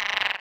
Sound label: Scorpion